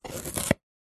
food sweet potato single chop knife wooden chopping board; zapsplat